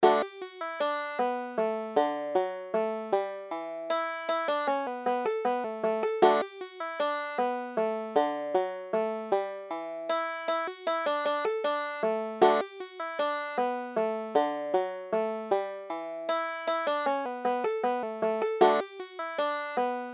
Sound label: banjo